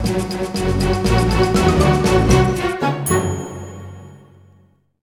Bosscombat Win; The Hobbit; PS2

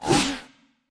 Dash; Caffiend

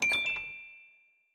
Goal

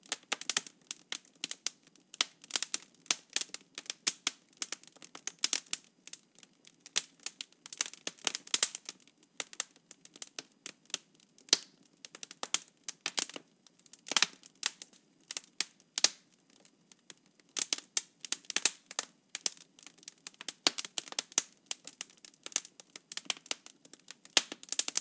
Fire Loop

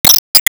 Ball Warp In

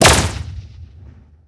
Troll Shooter Shoot Fire

